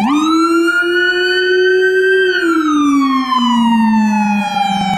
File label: howler wail